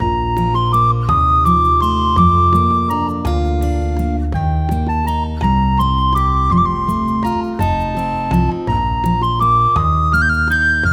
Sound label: Heartwearming Town; Sacred Earth Promise